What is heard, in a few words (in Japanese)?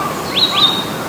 kibitaki